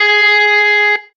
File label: electric guitar